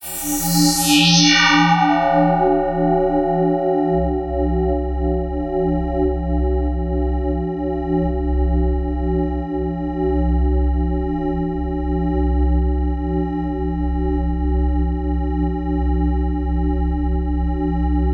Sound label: Atmosphere antimatter; sumo blanco